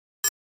Flash Beep HF Elect

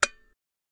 Light Switch Lamp

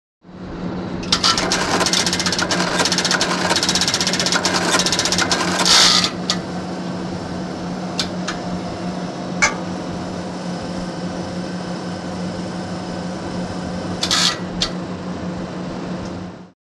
Computer Room Comput

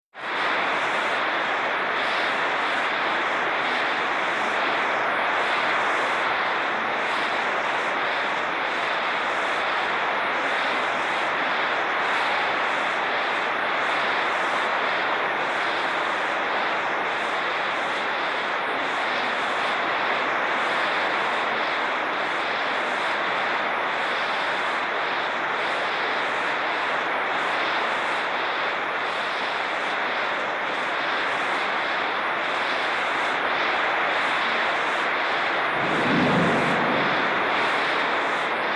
Monsoon Rain Tin Roof

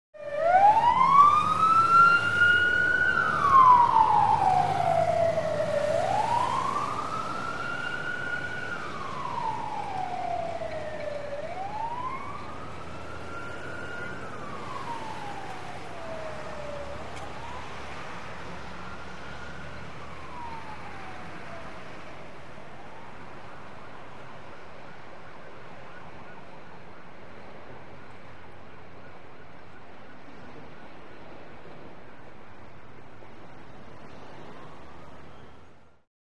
Siren Close, Away To Distant. In City Traffic.

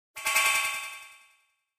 Laser Gun Wiggle Blast

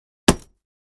Axe Paneling 2 Side Du, axe hits

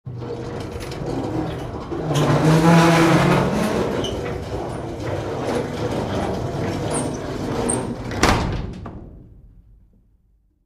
Antique Industrial Dryer Drawer Open

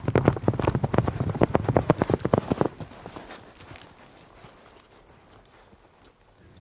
Horse Gallop In Stop

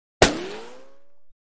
Bullet Ricochet Off M

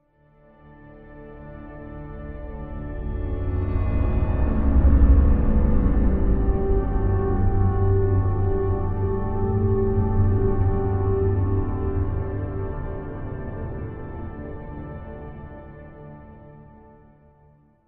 Music Eerie Sustain, orchestral

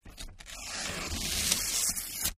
paper tear

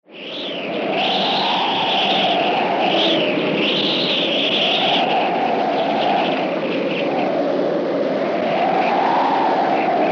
Birds; Light Woodland Birds

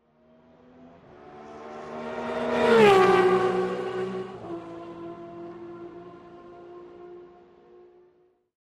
Sports Car By Med CU

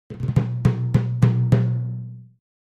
Timpani, (Hands), "Battle Finale"

